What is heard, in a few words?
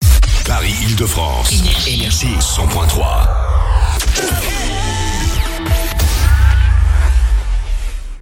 JINGLE; PARIS, 100.3 FM; NRJ